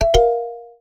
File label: Subtle; short